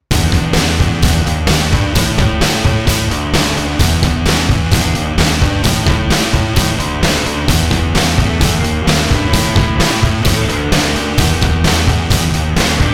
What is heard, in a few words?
noise rock